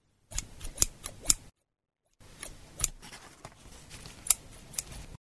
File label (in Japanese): ハサミの音